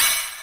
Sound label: eye sounds; sans